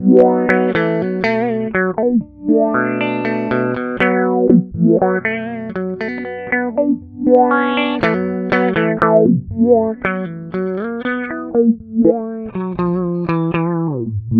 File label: Electro Harmonix Mod 11 Filter